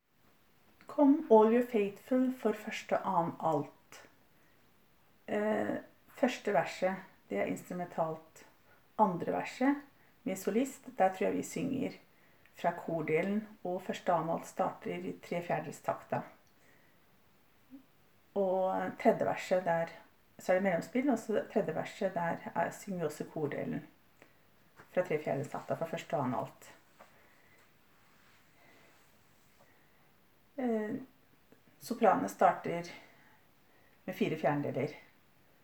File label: Come all ye faithfull Alt; Come all ye faithfull 1 og 2